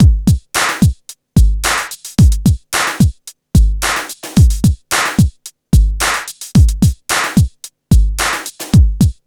TECHNO; INIS - Funky Xtreams

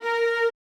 F#A3 L, Orchestra; AKAI Advance Orchestra Upgrade 97 Vol.1

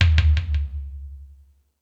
Space Drums, E-MU Pro-Cussion; drum machine

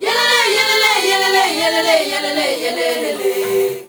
ZULU CHR, FEM. CHOIR; Spectrasonics; Heart of Africa 2

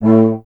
tuba; Miroslav Vitous - Solo Instruments VOL-2

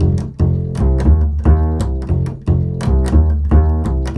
CHASE F, Bass; Spectrasonics; Bass Legends

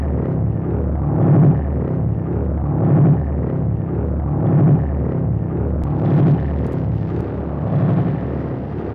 Crude Tape Loop, Tape delay; radiosonics

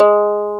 SPAN E1, NYLON G; AKAI S-Series CD-ROM Sound Library VOL-1

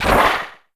SPLATE HI, PERC; NorthStar - The Wizard VOL-2; TSAR PERC

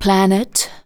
vox, Techno Trance; Ueberschall

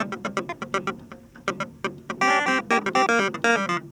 Clavinet, 70s Analog Funk; Sonic Foundry (Sony Creative Software); What it Is! 70s Analog Funk

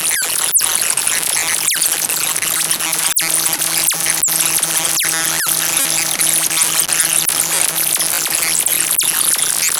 GARBLED RADIO, RADIO STATIC; Zero-G Ambient 1

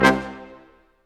BRS Quintet stac; Roland LCDP08

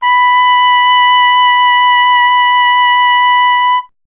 TP B; Miroslav Vitous - Solo Instruments VOL-1; Trumpet

